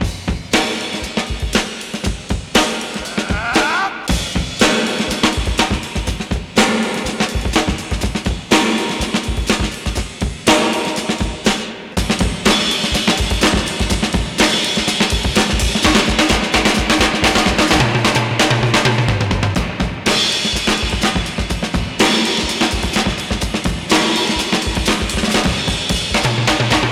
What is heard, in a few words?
soul drums, drum loops funky